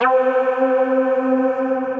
Dense String, Pads; Ableton; Skitter and Step

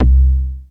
Upright, Analog Bass; Upright MS; Ableton; Factory Packs; Retro Synths; Analog Bass Vol 2

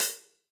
Hihat Closed Shank Warm And Low; Drum Hits; Standard Hits; Ableton; Factory Packs; Session Drums Club

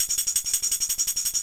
D&B Tambourine loop; Dance DJ; Percussion

